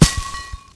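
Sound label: grass; Mafie 1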